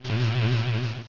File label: Laser Focus, battle anims; pokerogue